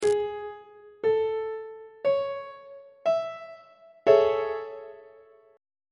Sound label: b double flat major 7th 3rd inversion on treble clef